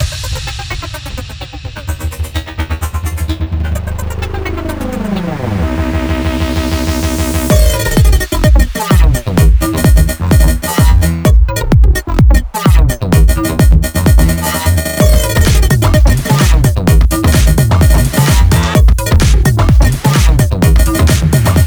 128BPM Tribal Kiss Mix; VFH1 128BPM Tribal Kiss Kit

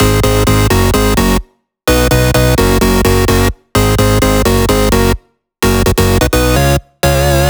128BPM Flatbeat Melody; VFH1 128BPM Flatbeat Kit

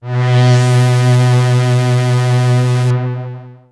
Roland D B2; Roland S100; musicradar-synth-samples

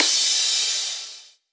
Crash; Vengeance Samples; VES1 Cymbals

